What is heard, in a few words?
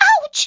SND HIT BEAVER; franklins birthday surprise